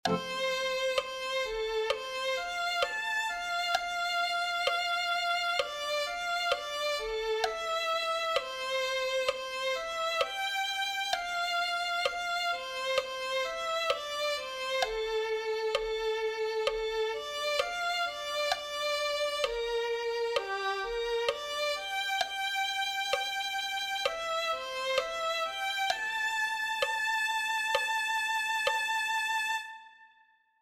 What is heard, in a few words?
violin 2